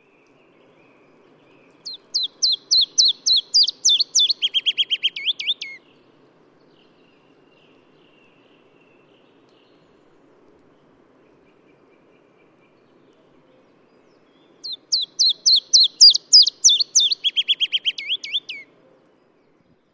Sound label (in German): fitis